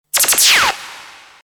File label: sound effect lazer; Laser; stonelove